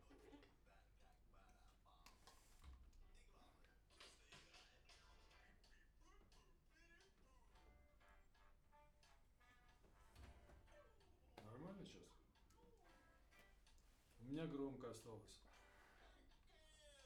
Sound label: Main Strings